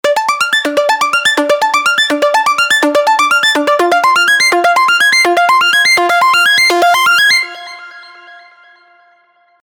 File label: Dusty Wurlitzer; Spire